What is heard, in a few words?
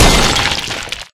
Break; Life of a Naomi